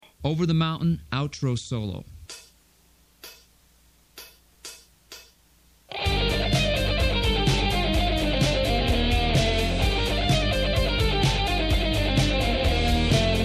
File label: outro solo